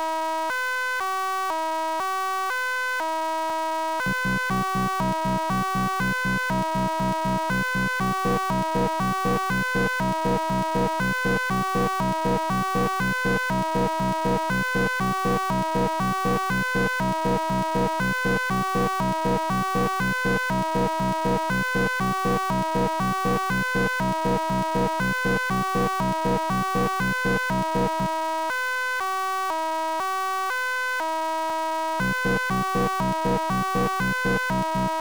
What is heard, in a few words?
sine